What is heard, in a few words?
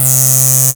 CREEPY C2, sequencer; Best Service Alpha Dance vol.2